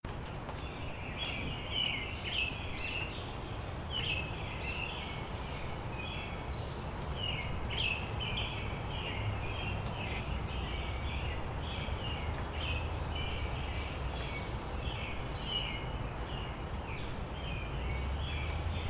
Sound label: birds early